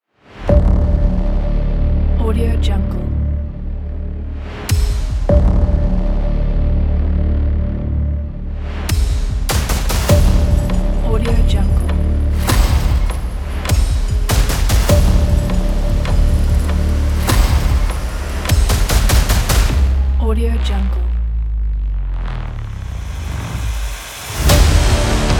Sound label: dark action trailer